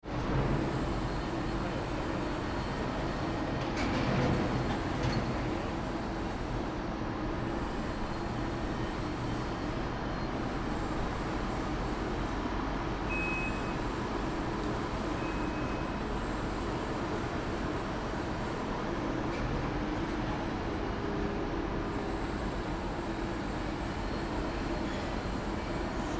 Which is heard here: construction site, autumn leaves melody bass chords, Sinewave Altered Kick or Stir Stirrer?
construction site